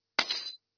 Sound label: key fall; svencoop; raccoon